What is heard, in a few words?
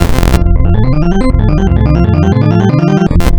Misc Iterations, wavetables; Architecture 32